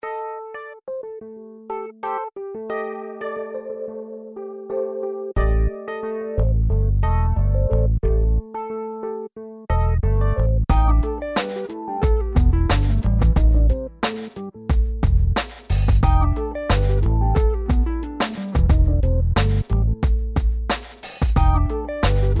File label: Jazz